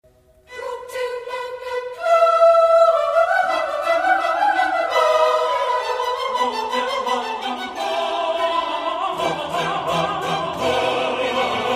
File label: Choir, Gloria, Propter magnum; Vivaldi